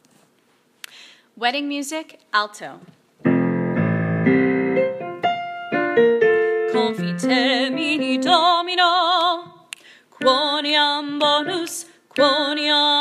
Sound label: wedding alto